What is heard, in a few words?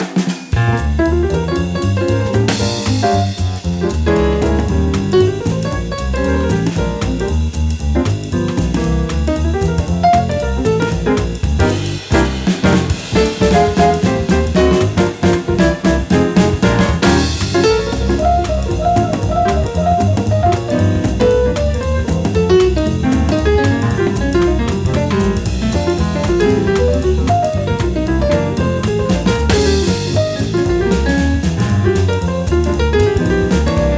Swinging Blues